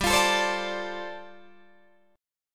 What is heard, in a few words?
G 9th Suspended 4th, Guitar DADGAD